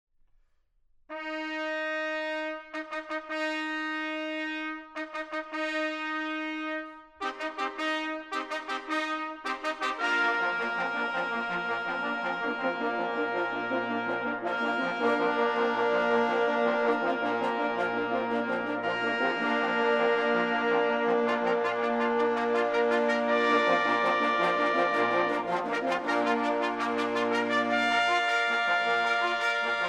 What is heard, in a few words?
Fanfare, Symphonic Wind; Vienna Philharmonic Fanfare